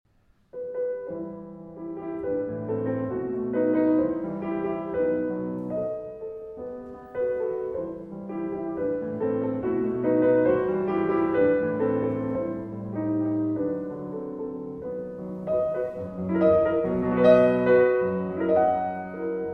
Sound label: Piano Pieces, Intermezzo, Andantino; 4 Piano Pieces, Op. 119; Brahms